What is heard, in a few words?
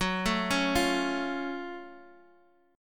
F sharp Minor Major 7th, Guitar, Open D; chordshape; down